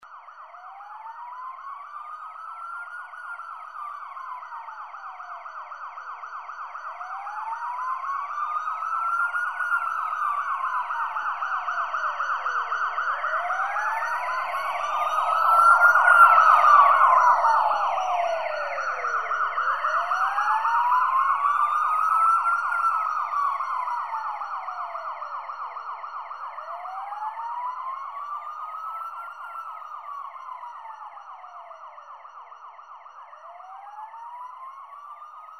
ambulancia; 30kmph; Fisica I; movimiento

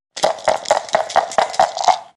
dados; oca

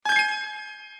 magia; alquimistas

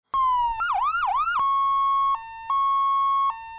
mal; redox

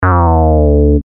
fallo; Trasvase 3 vasijas

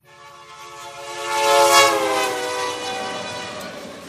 paso tren

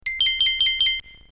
magia; alquimistas

